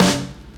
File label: drumbreak snare; Hiphop